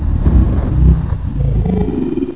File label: caged